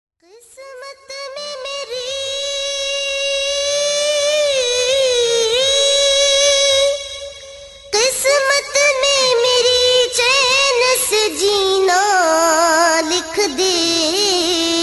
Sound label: Islamic Naat Ringtone